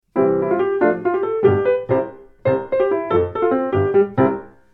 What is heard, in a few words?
tom jingle